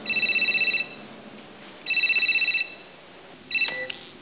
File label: Incomming Mail